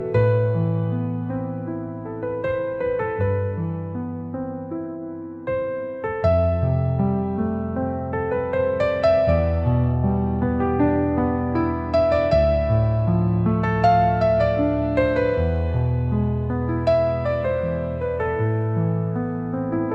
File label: Noise; Cathedral